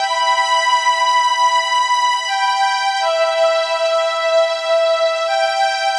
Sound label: Sweet Strings